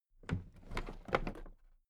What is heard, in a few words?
toolbox raise regular